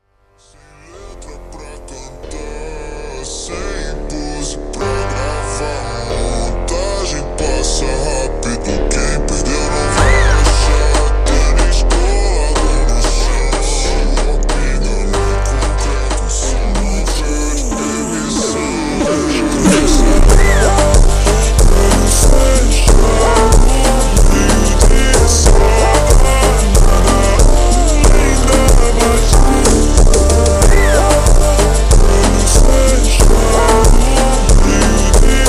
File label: Montagem Jumpstyle ultra Slowed